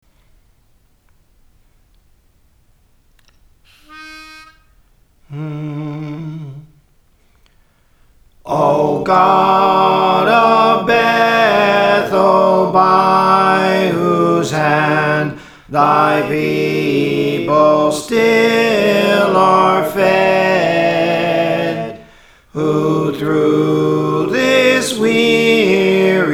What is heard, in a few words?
Soprano, Songs; Praise For The Lord Training; O God of Bethel